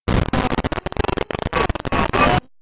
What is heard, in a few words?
game glitch